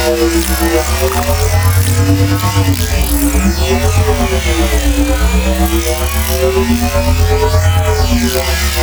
Factory Ceiling Conveyor Loop; Corpus Railjack; Robotics Factory

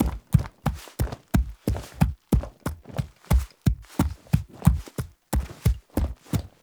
Horse Steps Trot Loop Default; Warframe; Duviri Horse; Vehicles

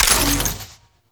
Ammo Pickup Magnetic; Ammo Ability; Warframe; Warframes; Frumentarius

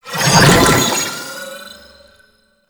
Mirror Ability Deactivate; Warframe; Warframes; Harlequin

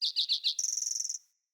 Bird Song; Pothia Bird Guide

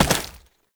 Step Ice; Warframe; Oraxia; Foley

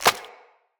Agaric Mushroom Grow; Resource Materials

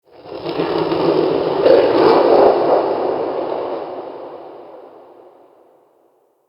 Crypt Cold Texture, Ambience; Champion Crypt

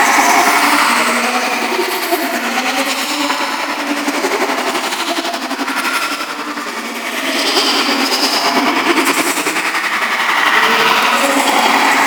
Target Ability Loop; Warframe; Warframes; Anima Animus

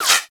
Laser Whizzby; Warframe; Bullet Impacts; Whizzbys

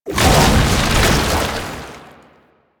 Proto Infested Babau Passive Stop, Enemies

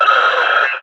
Enemy Pain; Warframe; Lasrian; Battle Barks

